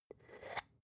spice jar scratch tonal open slow; industrie wirtschaft; gastronomie

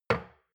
shot glass place table medium; berufe; gastronomie; soundpool

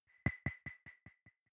button echo resonance noise; signale

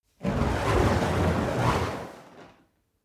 fire torch whoosh flutter long; swooshes-swishes

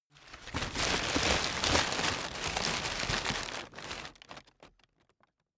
stuffing paper put in

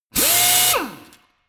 impactor hard burst; maschinen; bohrer

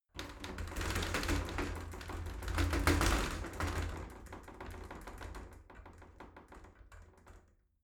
shower slide door rattle short

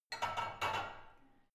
barbell only replace unsteady soft

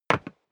step boots single wood parquet single stair down; schritte

